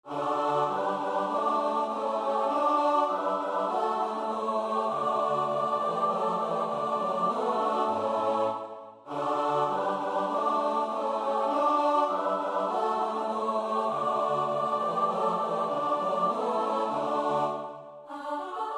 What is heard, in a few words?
midi; zlaczone nasze glosy